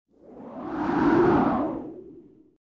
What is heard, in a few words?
Airy soft synth sweep